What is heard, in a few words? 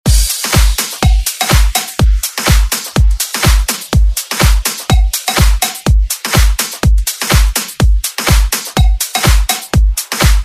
Dance Redrum